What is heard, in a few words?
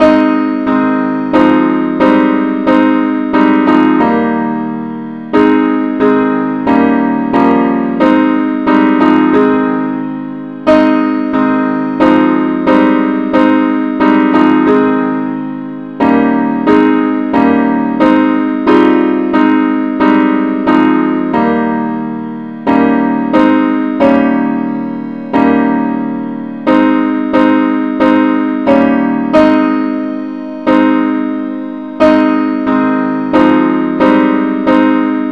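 advanced accompaniment key A flat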